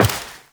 Step Metal, Footsteps, Walk; Warframe; Tenno

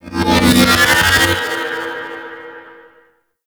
Arachnoid Camper Shield Up; Warframe; Corpus; Abilities

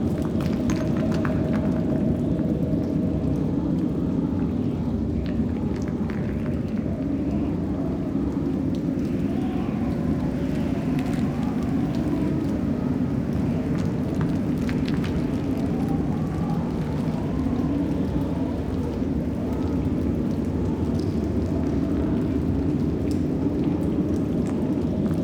Outpost Cave Amb; Warframe; Corpus; Outpost Defense

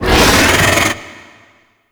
Attack Vocals; Gameplay; Golden Maw; Warframe; Ambience; The War Within

